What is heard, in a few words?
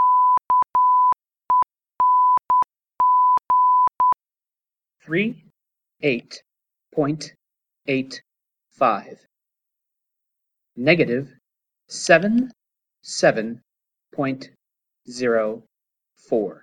echo